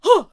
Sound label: Harvester Attack Vocals